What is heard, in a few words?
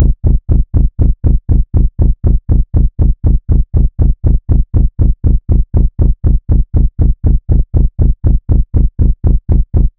driving rhythmic pulse